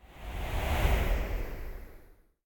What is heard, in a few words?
grapple; Scrat